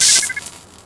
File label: code lock shock